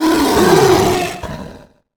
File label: troll